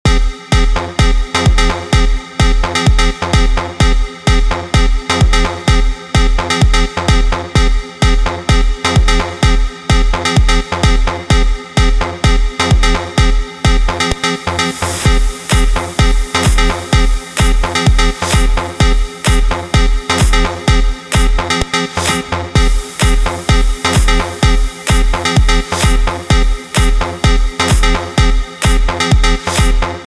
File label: Loop 128 PROGRESSIVE HOUSE